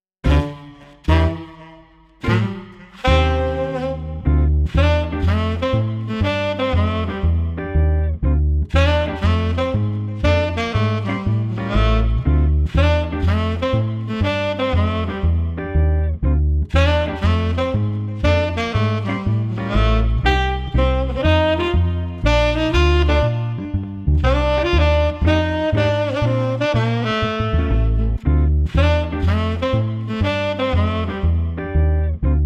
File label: Street Sax Lively Combo 120